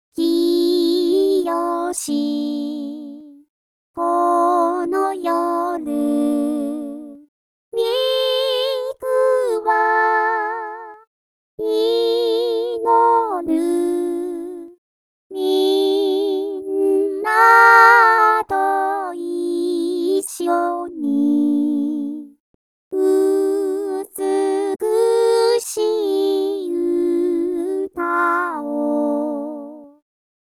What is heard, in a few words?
chorus